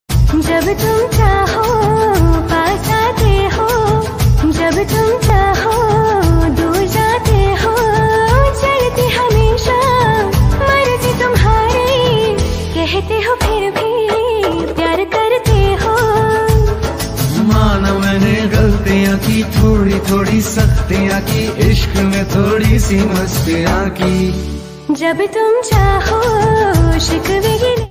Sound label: song ringtone hindi love ringtone